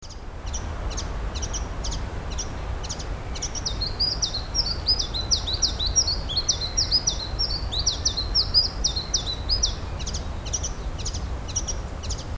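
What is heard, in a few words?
Phylloscopus collybita tristis song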